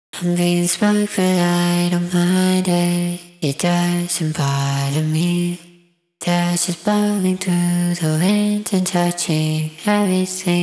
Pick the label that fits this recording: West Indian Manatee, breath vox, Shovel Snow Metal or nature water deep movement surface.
breath vox